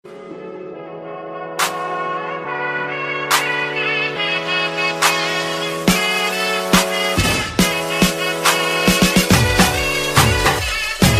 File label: remix ringtone trending bgm; mark antony; silk smitha